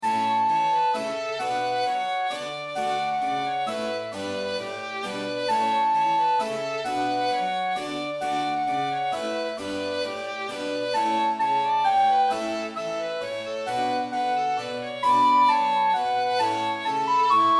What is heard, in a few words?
Minuet in D